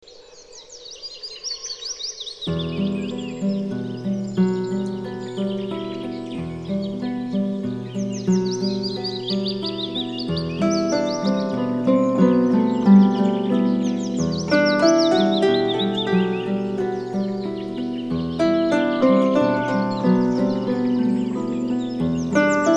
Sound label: Warblers Call